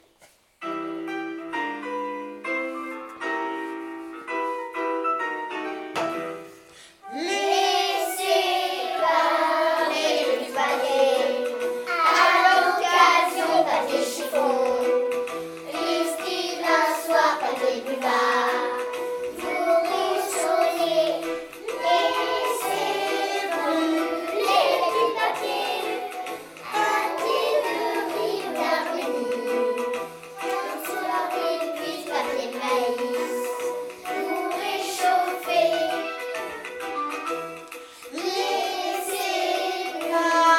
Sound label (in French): chante